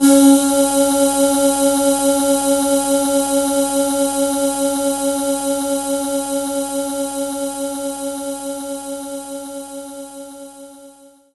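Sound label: Synth Vox Aahs C#4; knights try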